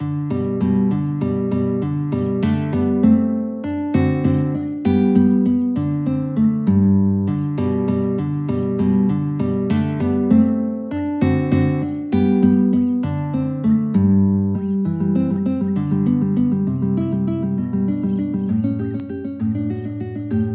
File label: Waltz in G guitar